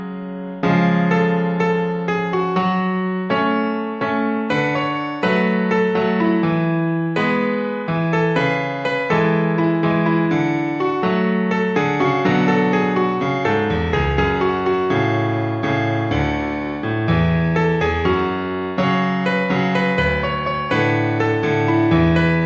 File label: instrumental